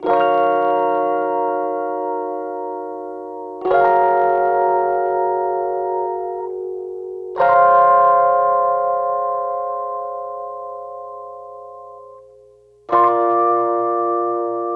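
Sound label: Melodic Loop Rhodes A minor 65Bpm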